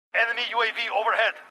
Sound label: ahhhh